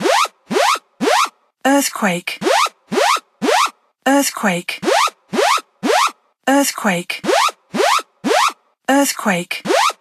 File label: earthquake; etws; Android; CellBroadcastReceiver